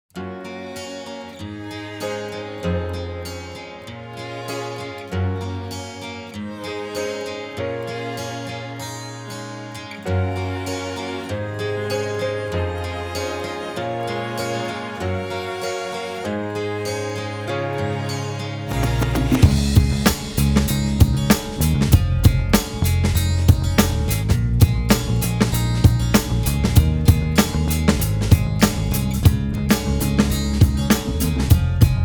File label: Instrumental; Gintama